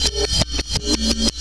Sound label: Ride Rev, Percussion; Beat Components